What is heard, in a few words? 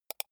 computer mouse wheel click fast soft